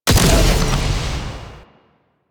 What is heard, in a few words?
Rifle Alt Fire Shot; Warframe; Weapons; Corpus; Crp Ruban Rifle